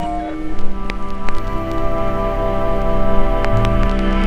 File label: Fx Vox; Equipped Music; Loungin House